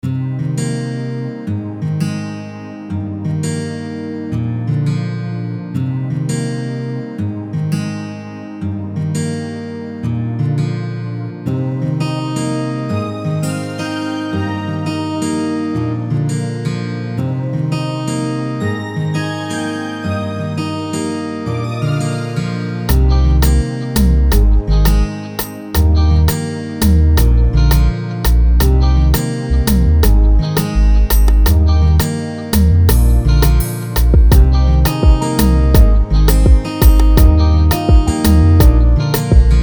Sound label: zouk; zouk lab; ish samples; zouk lab lite